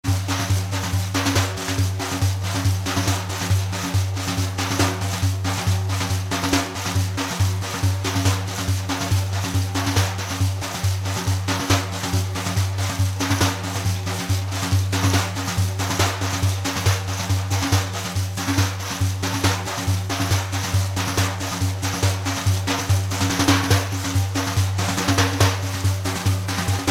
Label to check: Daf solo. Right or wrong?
Right